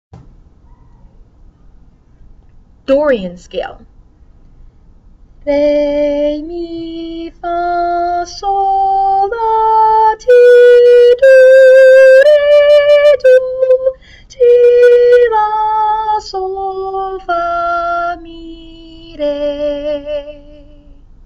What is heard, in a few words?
dorian scale